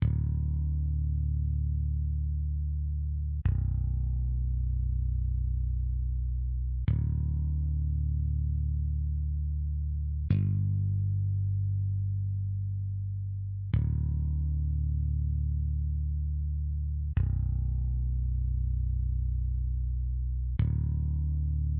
INTRO BASS ONLY DRY; KONTAKT LIBRARY

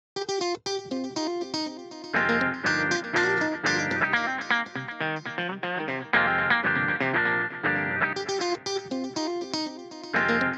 DELAY GUITAR DELAY ON

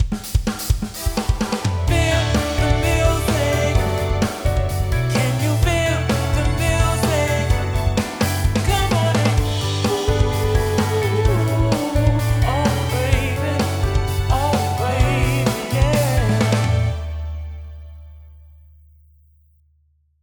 STRINGS SECTION SAMPLE SONG

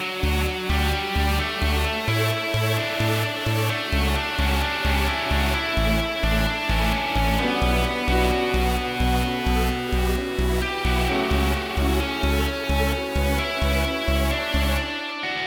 ELECTRO ROCK SYNTH

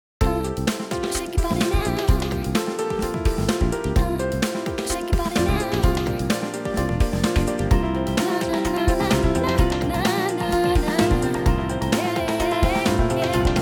mix technique compressor on

